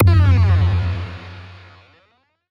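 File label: endings outro, disappearing fading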